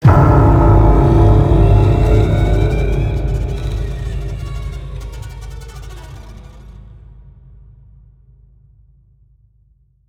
Horror Stinger

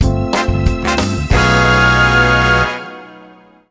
Exiting Vehicle Jingle; The Simpsons Hit & Run; ps2; The Busy-Body Housewife

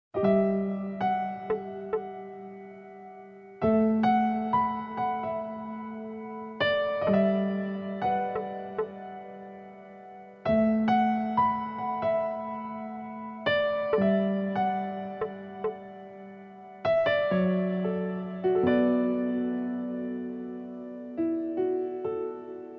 piano version; noir original soundtrack 2

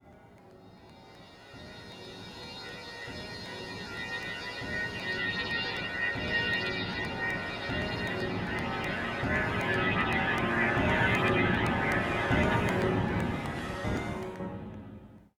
BGM Lobby; metal mind; xbox series xs

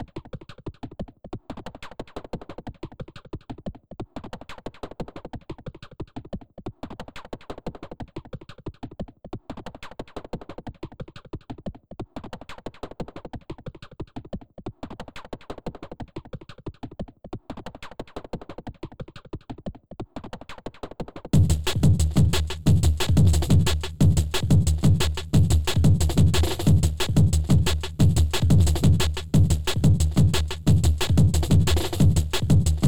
Main Drums; Helios

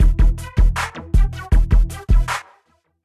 Action

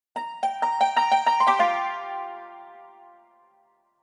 Dialogue Box (Yangqin); B Path of the Teal Lotus

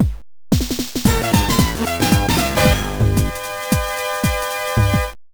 High Score Table; super puzzle fighter ii turbo; gba

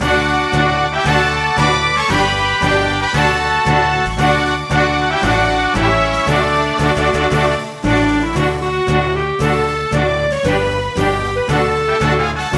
Music event; Music Game Eikan

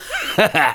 Emote Laugh; Twitch Skijump

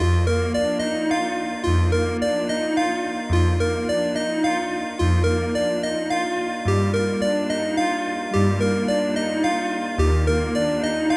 Loop 108bpm; Oprever; jenny leclue detectiv

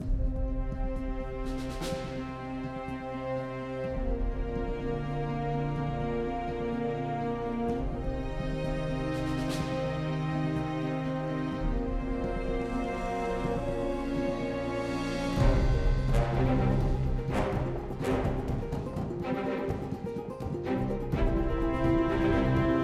Music industry tension; Kick & Fennick

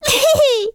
Emote Laugh; Target Hungryfrogs

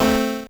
level squish A C; earthnight

